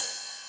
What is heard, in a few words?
EDM Future Ride, Cymbals; Big EDM; Future House Tools